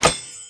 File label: Menu Next; Rayman Jungle Run; android